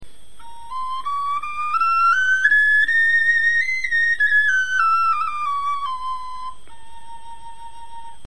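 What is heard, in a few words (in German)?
tonleiter, Knochenflöten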